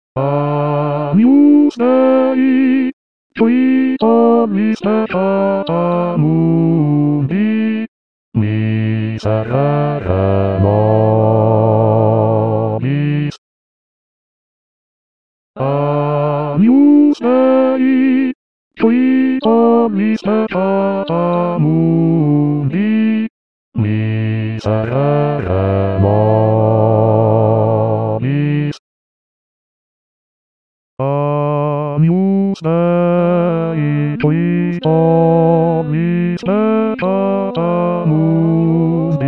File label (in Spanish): agnus dei de la misa brevis bajos